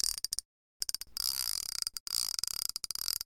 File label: reel wind